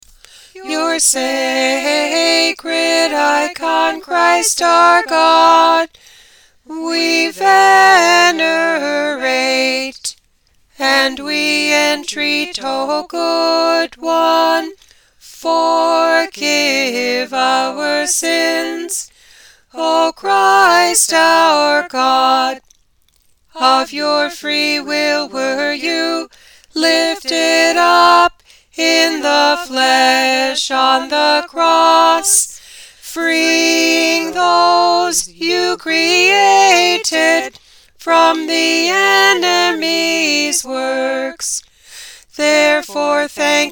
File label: alto emphasis